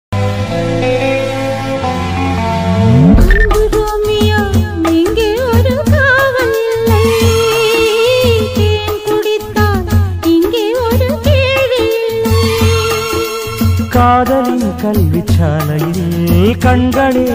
tamil 90s melody

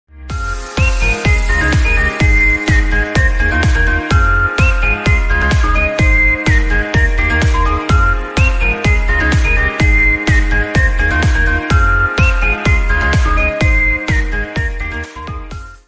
birds no copyright music ringtone; vlog

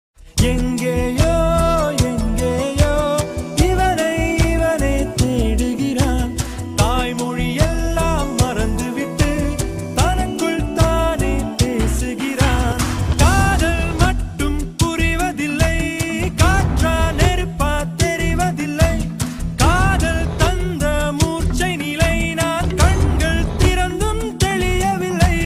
song tamil; kadhal konden movie

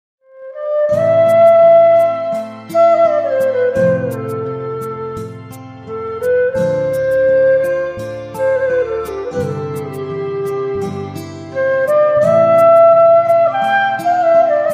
flute ringtone bansuri love ringtone

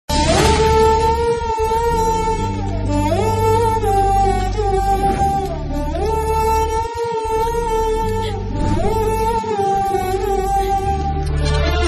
sad bgm; unakkum enakkum

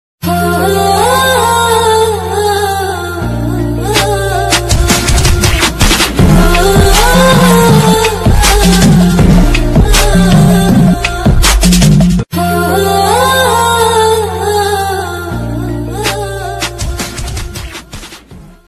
bgm ringtone instrumental ringtone phone ringtone

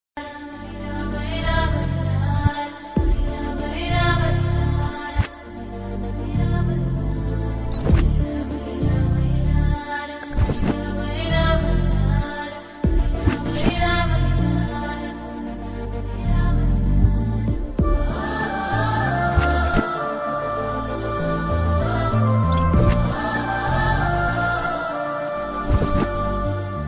sad bgm ringtone; ntr dammu background music

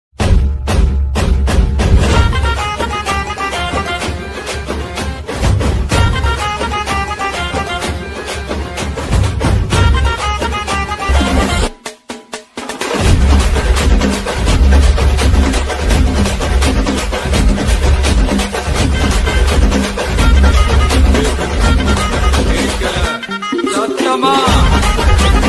bgm ringtone mass bgm tamil; garuda; thalapathy vijay; mking zone

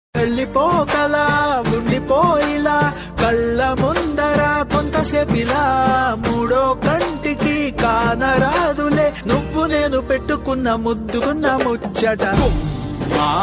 song ringtone Telugu bgm ringtone marriage band; ambajipeta

